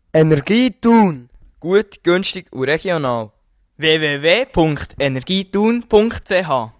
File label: jingle; energie thun